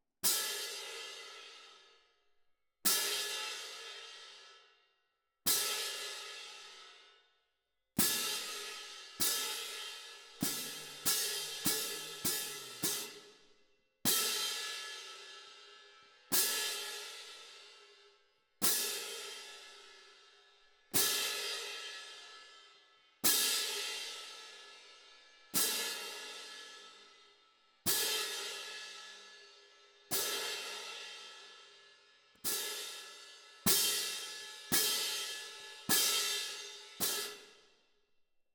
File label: Cymb Close